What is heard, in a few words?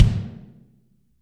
BD, Real Mega Drums; Best Service; Real Mega Drums VOL-1